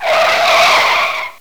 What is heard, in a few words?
Tire Screech; Drums